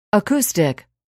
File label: acoustic